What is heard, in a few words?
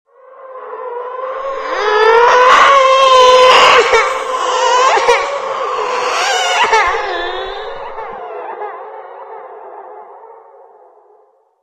cry